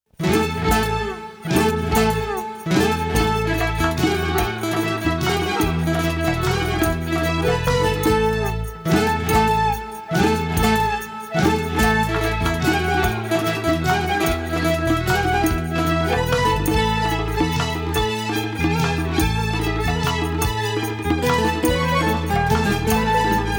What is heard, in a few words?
Instrumental